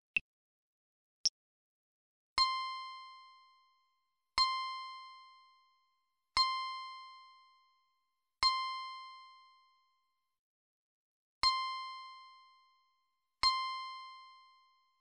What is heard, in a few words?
c guitar